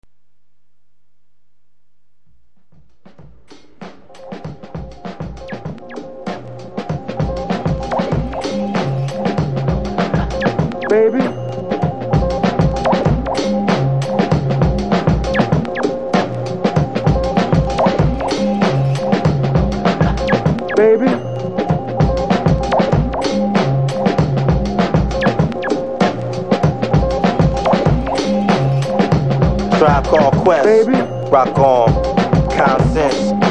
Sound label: shouts alt